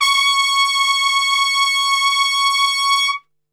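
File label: C#4 piccolo trumpet, Brass; Best Service; Brass Super Section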